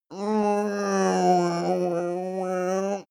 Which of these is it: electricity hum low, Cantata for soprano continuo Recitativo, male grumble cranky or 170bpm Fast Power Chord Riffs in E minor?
male grumble cranky